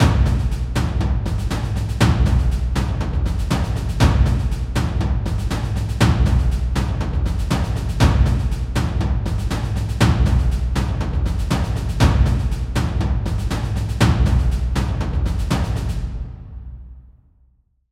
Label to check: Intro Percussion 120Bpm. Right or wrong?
Right